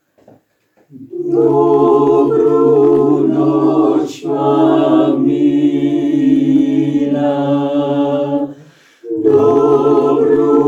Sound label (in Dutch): Dobru Noc, vocaal ensemble, harpiste